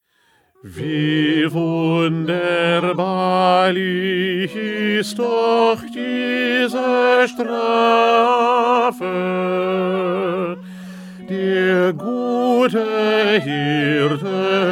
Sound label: Bass; Bach; St Matthew Passion